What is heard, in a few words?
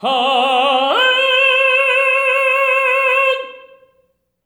TEN C4, TENOR; TENOR OCT